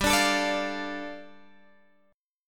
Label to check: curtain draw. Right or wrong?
Wrong